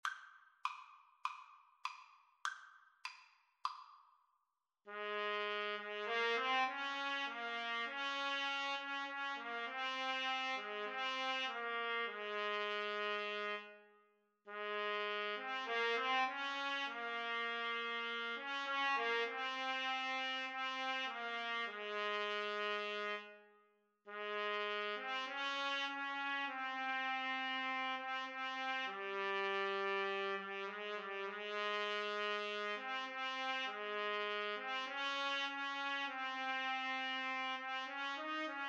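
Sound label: how great thou art trumpet duet karaoke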